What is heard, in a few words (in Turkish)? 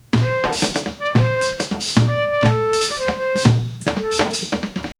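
canlı yüksek tempolu hafif; roman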